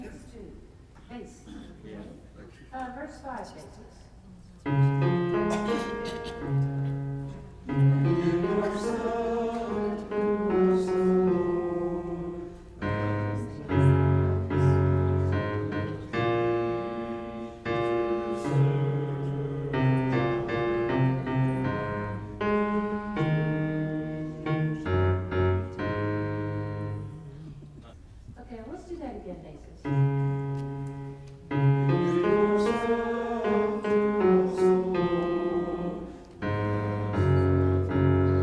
bass; Gather Hymnal

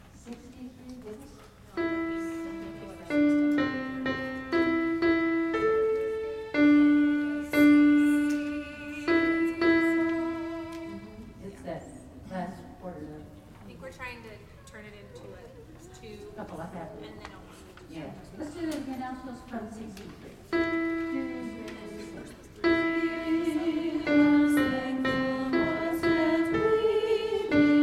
alto

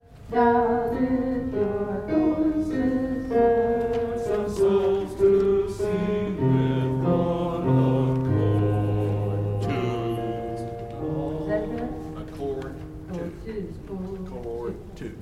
bass

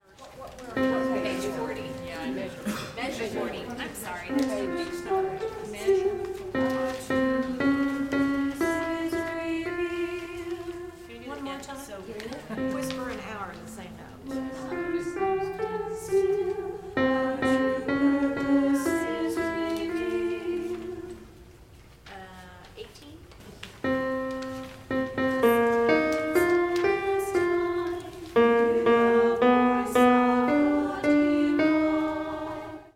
alto

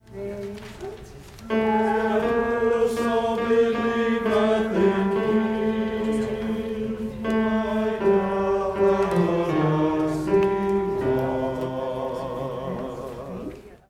bass; For God So Loved the World

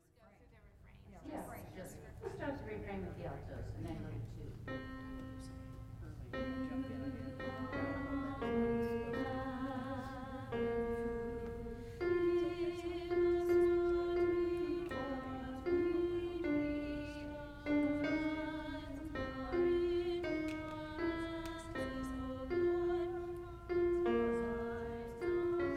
altos; Gather Hymnal

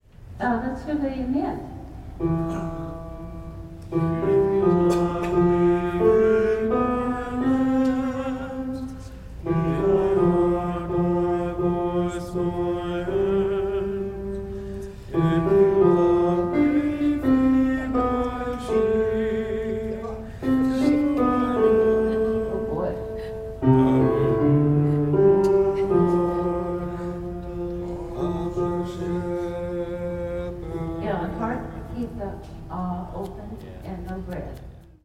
men; Gather Hymnal

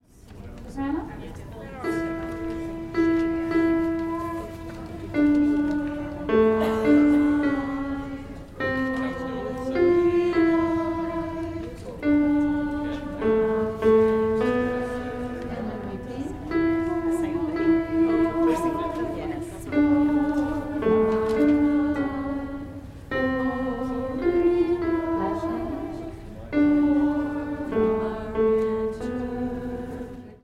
soprano

